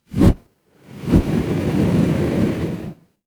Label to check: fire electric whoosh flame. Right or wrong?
Right